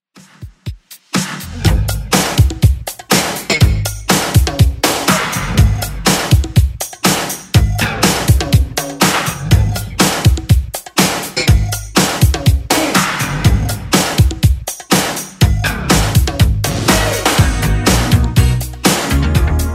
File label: 80s Redrum 122bpm